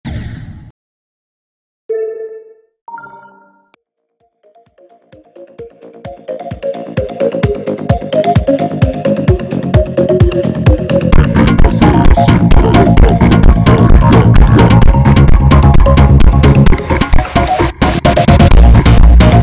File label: Funk